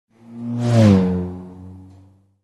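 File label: zvuk mashiny, car sound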